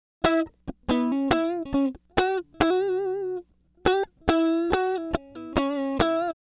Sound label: 070 Pop Guitar S-Note Melo C# Min, Electric; Pop Rock; Electric 055-099 bpm; Pro Tools Essential Loops; Digidesign